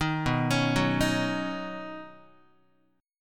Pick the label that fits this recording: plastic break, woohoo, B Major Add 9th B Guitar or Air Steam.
B Major Add 9th B Guitar